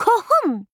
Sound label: combobreak; テンネンスイ・フリーナ; kodama